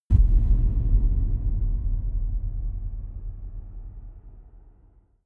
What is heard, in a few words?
dark outer space shadow, hits impacts